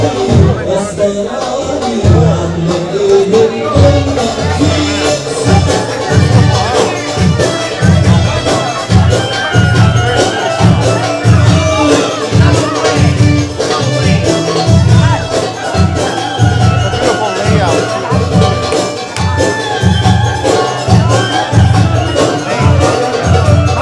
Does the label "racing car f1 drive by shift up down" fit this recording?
No